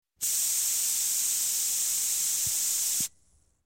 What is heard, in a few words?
Spray Can Aerosol Can; Sound Ideas 2000 Series